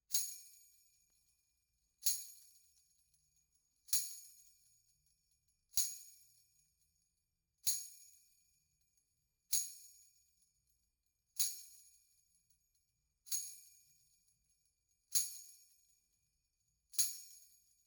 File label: Tambourine